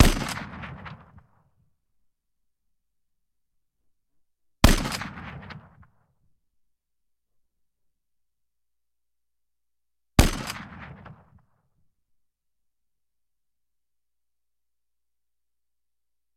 Browning 82 Pistol S

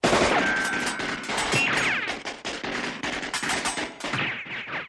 Gun Fight Left Shot

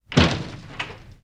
Door Open Wooden Gla

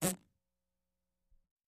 Close Up Fart Light; farts

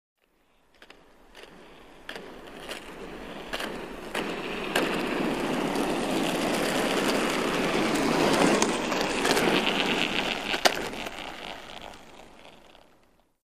Roller Skating Skat

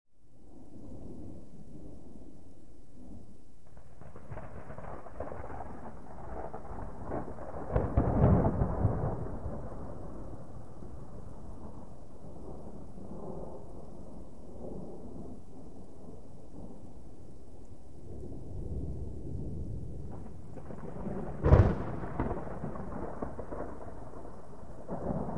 Thunder Medium Distant

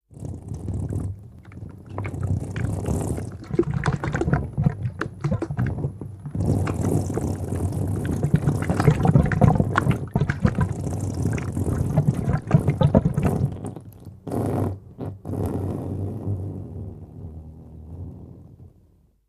Blood Breath; gore stabs & eat

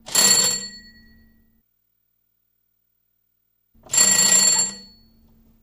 Doorbell Old Style

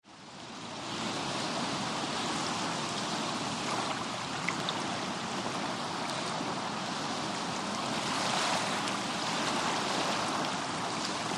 Lht Surf Heavy Surf BG

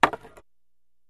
Staff Hits Medium, spears & staffs

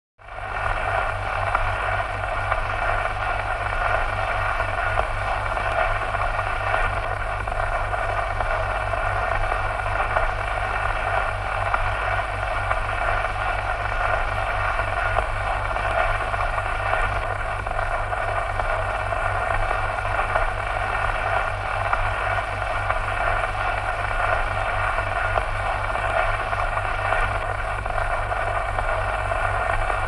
Record Player; Record Needle Running In Groove.; record players

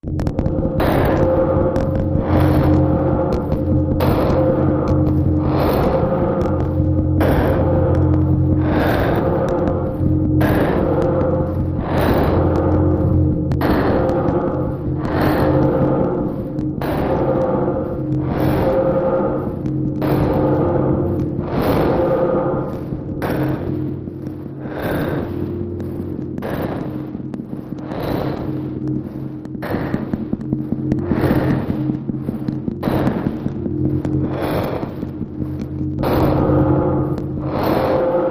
Machine, Air, Breathy, Electric, Low Arc; Liquid Respirator

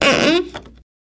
Nail Rip End; hammers

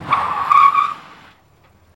Cadillac 72 Skid Stop; Cadillac 1972

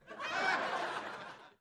Crowd Short Burst, small int

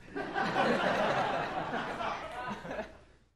Crowd 100 Short Medium, medium int

